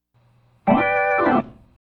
Organ, 70s Analog Funk; Sonic Foundry (Sony Creative Software); What it Is!; Slinky's Place

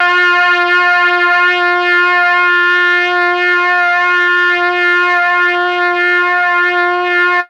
MEL BRASS; ILIO; Propeller Island; Legendary M400

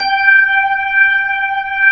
B-3, Jazz

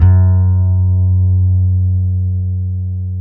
F#2 BASS; Zero-G - Chemical Beats

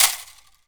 PU IL, HAWAII PERC; AKAI S6000 CD-ROM; WorldSounds

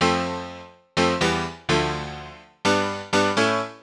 House Piano (125BPM), retro house

